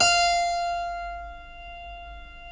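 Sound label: F4 clavinet right, Keyboards Acoustic; USB Soundscan vol.17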